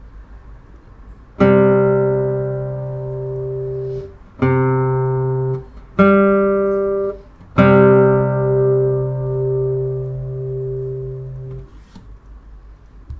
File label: perfect fifth